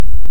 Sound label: Hydrophone; OneOcean